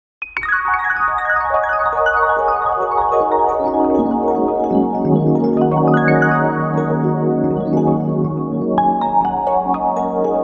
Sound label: waves